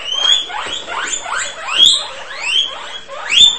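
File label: crazy squeal of several guinea pigs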